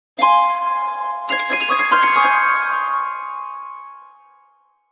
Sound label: laugh; League of Legends; Sona